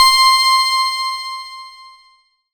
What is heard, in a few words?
keys C6, PPG Wave 2.2; Leads and Keys